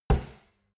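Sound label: step soft stomping, footsteps; schritte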